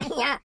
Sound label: YODEL YELL, World Voices